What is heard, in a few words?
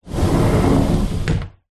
household door sliding cheap